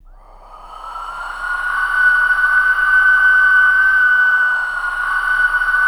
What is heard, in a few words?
OVERTONICS, VOCAL WIND; ILIO; Vocal Planet; Jazz & FX